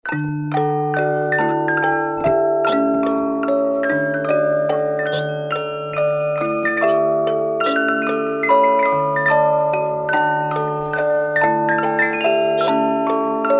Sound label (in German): Kleine Walzenspieldose